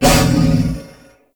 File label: Wolf Bite; Animals; Duviri